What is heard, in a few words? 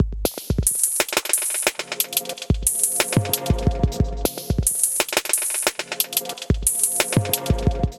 Tokyo Sound Kit; Drums; Ableton; Factory Packs; Glitch and Wash